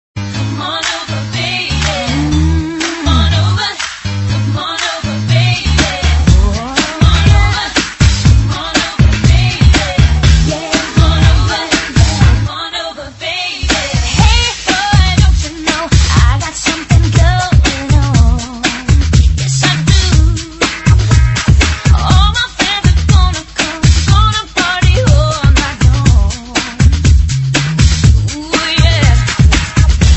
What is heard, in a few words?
hiphop jazz